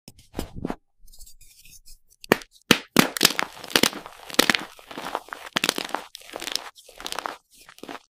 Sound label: chalk crunch; اسمر